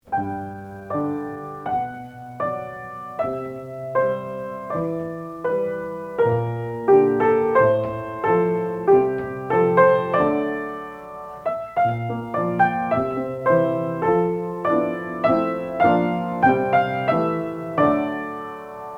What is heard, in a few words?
piano